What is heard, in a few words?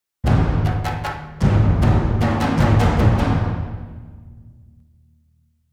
Combat In; Skellige